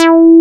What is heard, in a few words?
BASS E5 MF; MR.J BASS